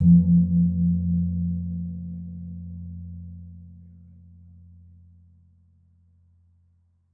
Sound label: Kempul, Gamelan